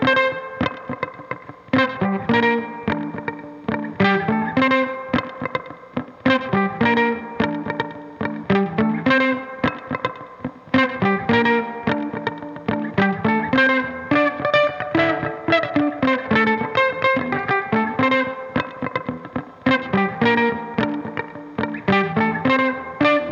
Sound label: guitar rhythm